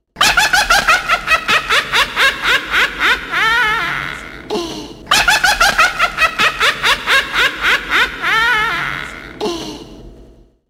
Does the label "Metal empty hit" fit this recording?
No